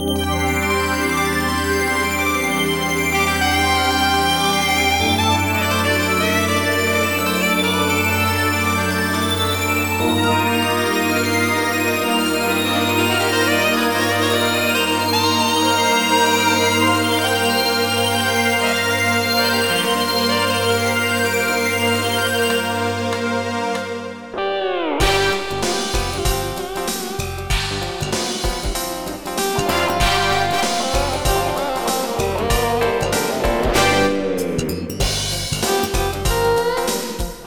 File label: funk hard; Software Explosion 2 - Virtual Computer Pets; yamaha xg